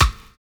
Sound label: HORSE BEA; Univers Sons